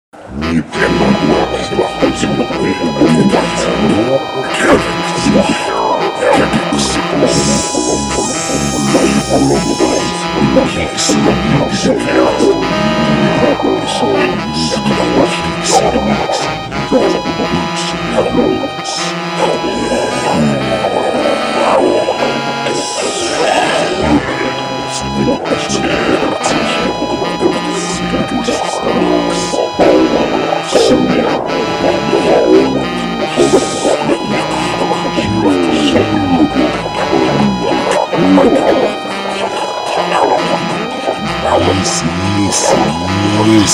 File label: non-Animal noises